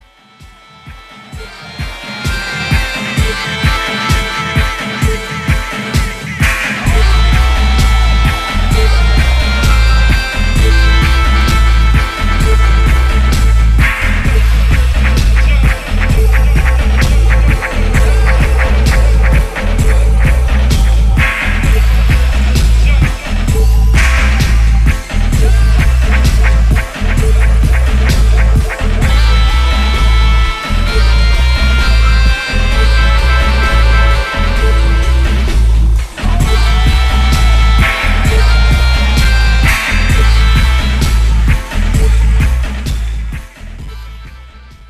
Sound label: African Drums